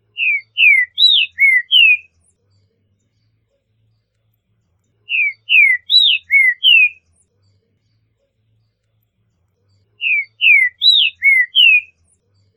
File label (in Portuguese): Canto do Trinca ferro